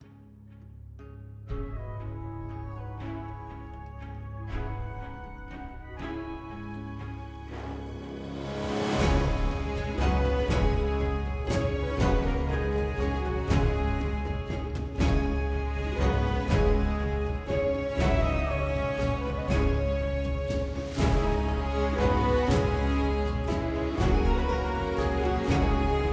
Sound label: Menu; Gaia and Varyn